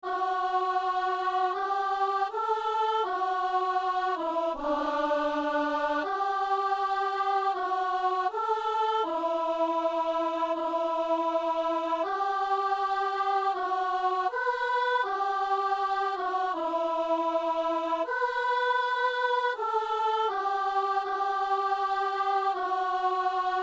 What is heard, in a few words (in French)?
vienne ton règne sur notre terre, soprano